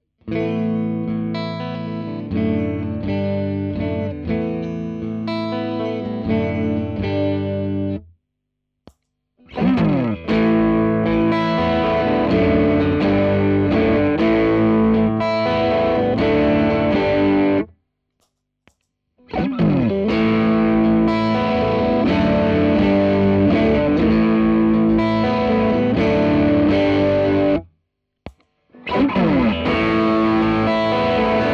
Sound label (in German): 12 Uhr Gain 12 Uhr Tone GLASS